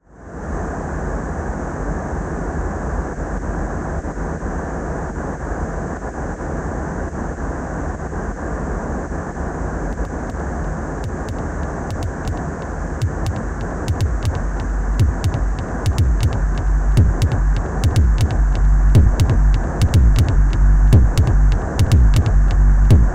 Kickain Mono Spectral Noise SideChain Kick plus FX; Knobula